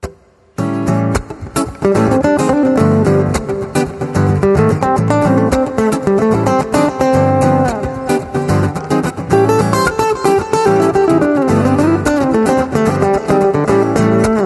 Instr, Acoustic Guitar

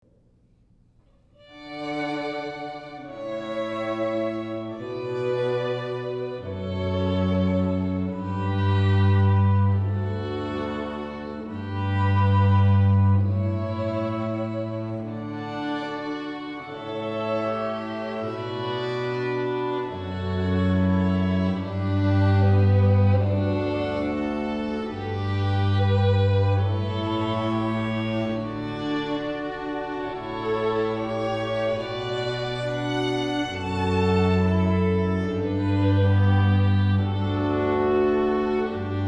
Canon in D String Quartet; Classical

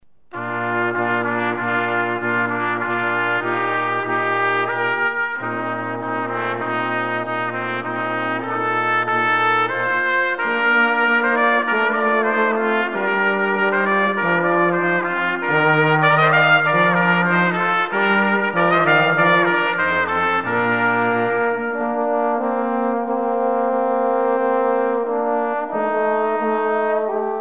brass quartet; Sheep May Safely Graze

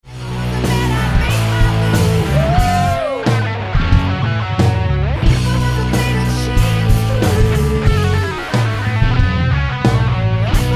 Paris Live, Cover Band

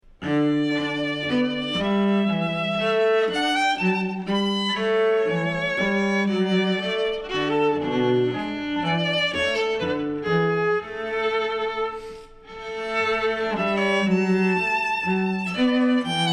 Minuet, classical duo; Concerto Grosso Op.6 No.5; George Frederic Handel; Driftwood

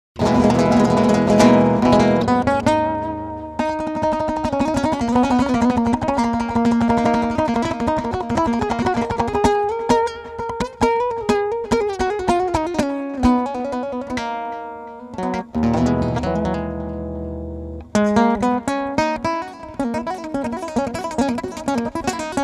Flamenco Improv, Solo Fingerstyle Guitar; Acoustic Guitar

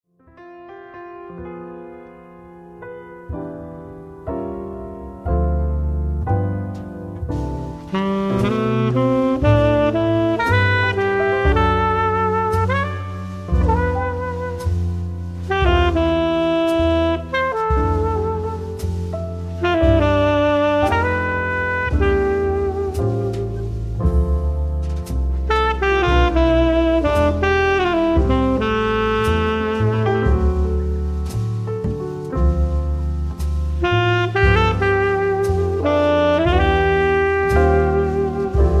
Jazz Quartet - Sax Piano Bass And Drums

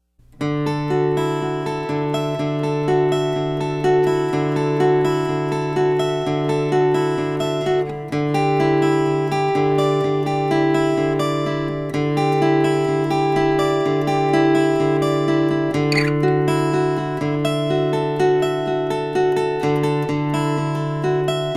instrumental

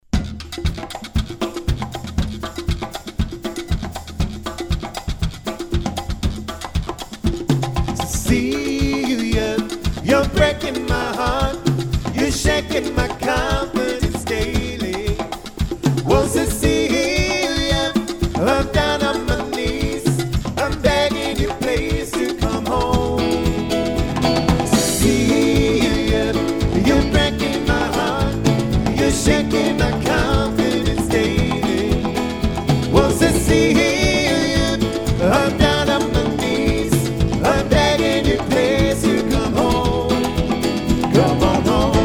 Dance Band

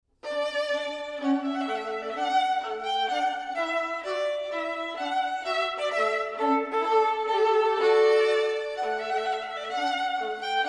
2 violin duo; string quartet; Clark Trumpet Voluntary

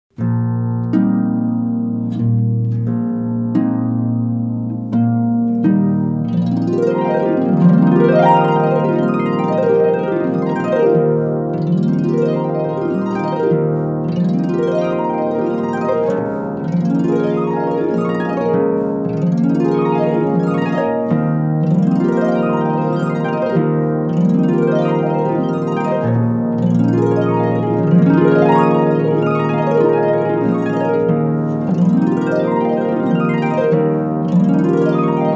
Harpists; Classical

